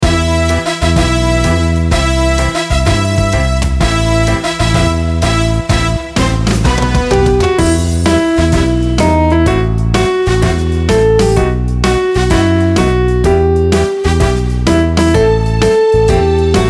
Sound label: karaoke